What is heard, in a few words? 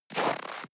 step snow walk single